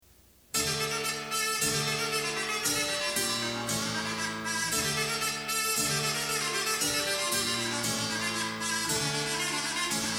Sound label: Polish dance; Anon Polish